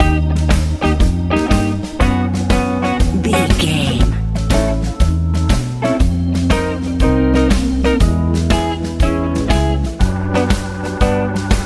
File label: blues rock